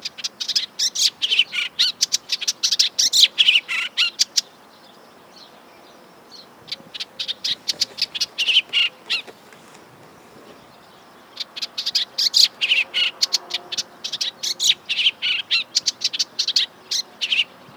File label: Hippolais pallida